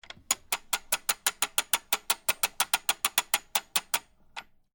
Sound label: Phonograph Crank, Hand, Crank, Turn, Machine; Technology; 1895, Berliner, Trademark; Miscellaneous Electronic Sound Effects; BlastWave